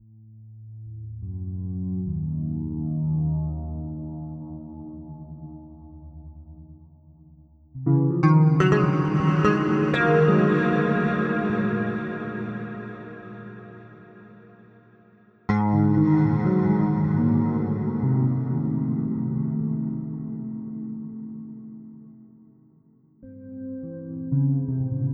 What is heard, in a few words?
guitar Whale Calls